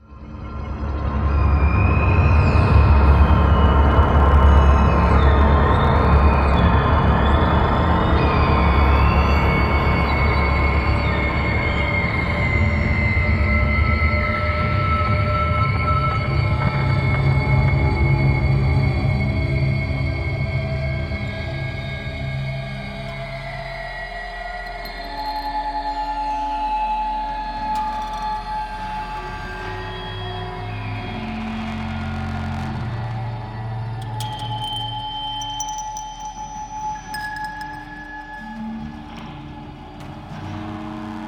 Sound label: Drone Sting